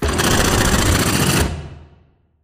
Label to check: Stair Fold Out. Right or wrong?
Right